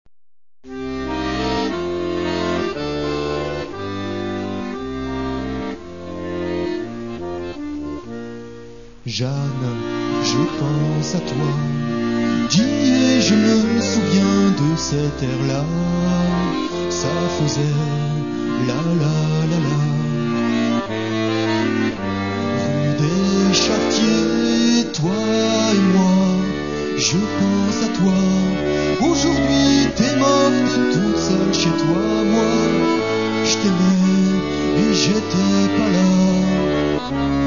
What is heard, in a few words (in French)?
valse